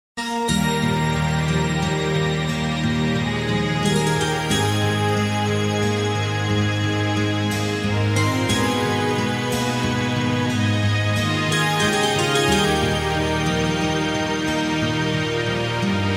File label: Melody